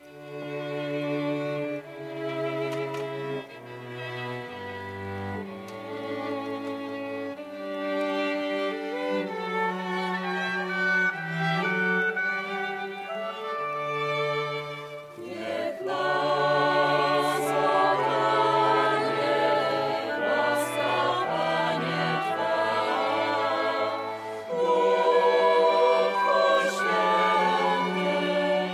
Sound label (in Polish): Chor i zespol instrumantalny; Canticum Gratiorum; 25-lecie AK